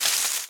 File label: footstep foliage; Scrat